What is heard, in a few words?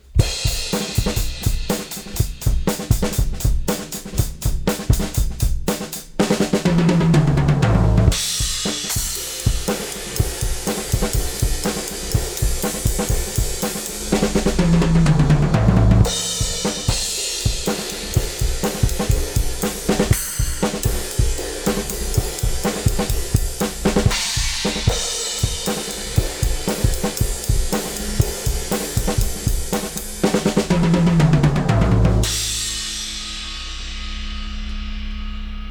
Bus Processor THRESHOLD 25.0 dB MAKE UP GAIN 8.00 dB TONE SHIFT TREBLE